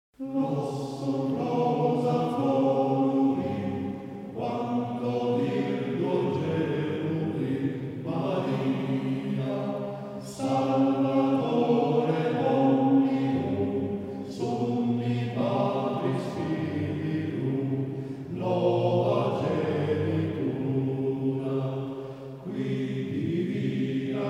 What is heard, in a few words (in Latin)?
Flos Ut Rosa Floruit Polifonia